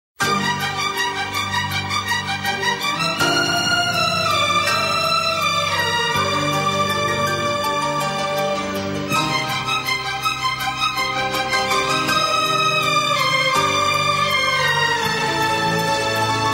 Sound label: Violine